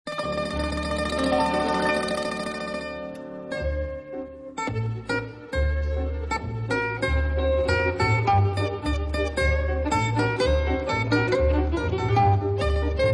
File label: balalaika